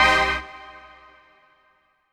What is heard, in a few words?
HOUSE, House Dance